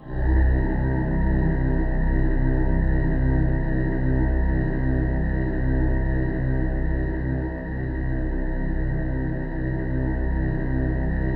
C1, Synth Leads

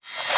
Reverse Cymbals

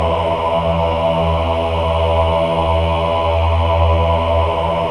men ah, Choir of Ahs; Roland - LA Composer vol. 1

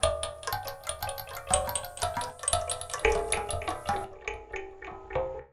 Mbira Kulfi, Acoustic Beats; Sonic Foundry (Sony Creative Software); Myths of Technology

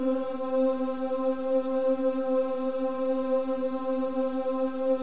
BOYS OOS; Spectrasonics; Symphony of Voices

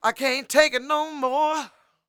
SING W, Vocal Planet Blues & Soul, BLUESY; ILIO

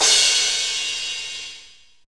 ROCK PERC.

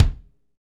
KIK FNK, Funk Kits, Roland; Northstar - Drumscapes Roland

